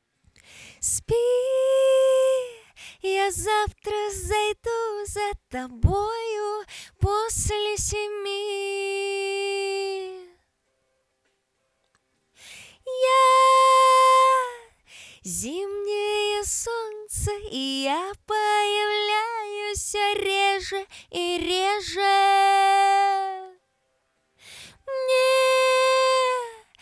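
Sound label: zhenskiy vokal, female vocal; Radiosistema Oktava OWS U1200H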